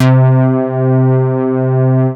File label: C3, BASS; Q Up Arts; Dream Experience; BASS BANK 13